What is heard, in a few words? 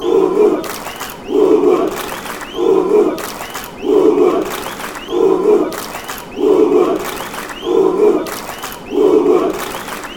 strike strike, fanchants, turkey